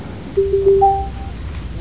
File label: chime; Zathras